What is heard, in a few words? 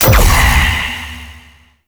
Gather Enemies Ability; Warframe; Sentinels; Abilities